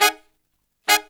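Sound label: Pop & Funk Brass, 110 Ab, right; Best Service ProSamples vol.25; AKAI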